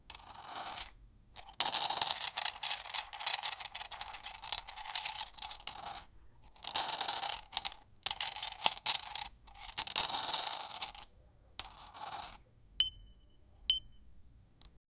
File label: golden coins collect, coin collection